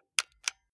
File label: console controller xbox rt press